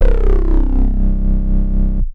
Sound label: bass